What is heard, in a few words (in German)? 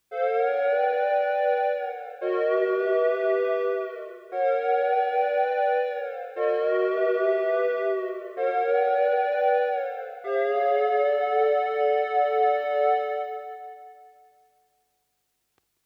Opus 3 weicher Rechteckklang